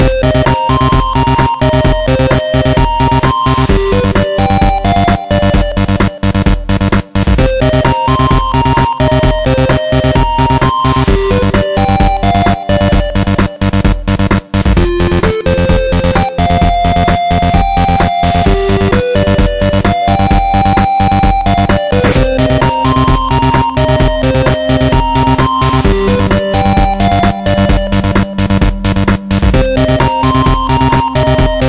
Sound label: Gameover